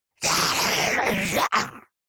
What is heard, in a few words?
Demon beast imp monster pain damage hurt